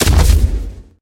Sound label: plastic fall; Golden Crayon Sounds